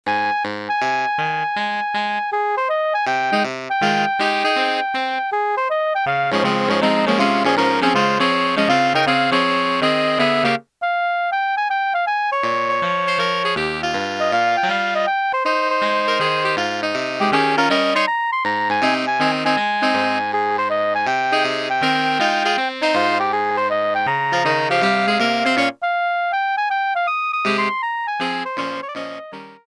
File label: midi theme